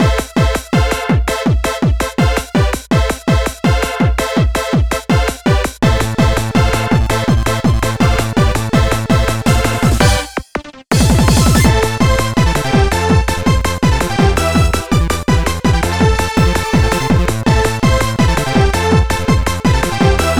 Para-Para Stage; pinky street kira kira music night pop town gamerip

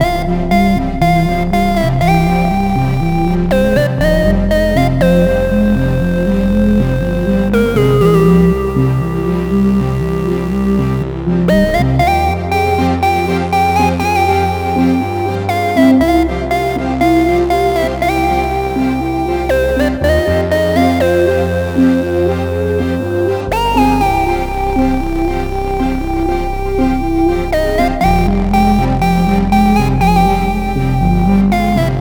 boss loop 120bpm; game soundtrack; chicory a colorful tale; ps4

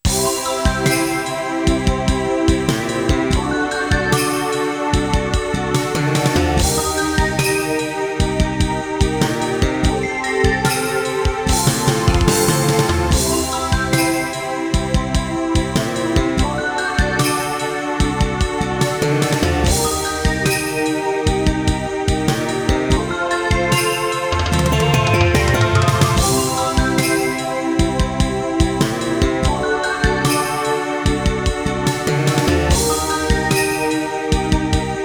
Sound Test SC-55

